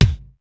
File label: bass, instruments